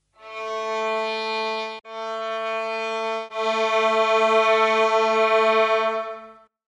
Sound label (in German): geige